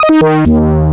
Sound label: synth; bars&pipes sound kit 1; Instruments